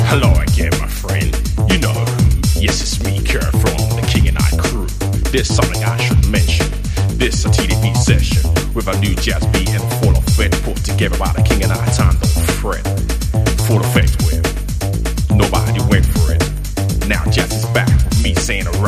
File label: JAZZ HOUSE FUNKY RAP